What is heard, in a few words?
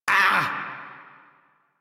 Pain Lich D; Kuva Lich